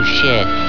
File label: fall; alienarena; johnny5